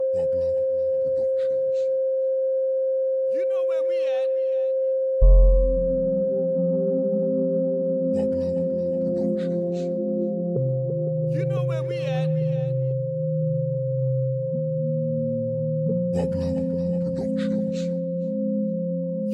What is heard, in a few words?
Reggae Dancehall